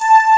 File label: DG WAVE, DIGI SYN